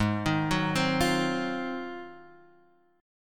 G sharp 13th G sharp, down

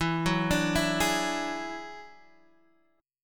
Gb 7th Suspended 2nd Sharp 5th E

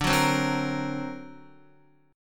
D Augmented 7th D down Guitar; D+7 strummed; JGuitar

